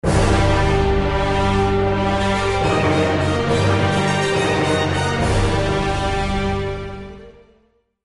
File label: sting lose imperial; gameplay music; stings; SWR chopper chase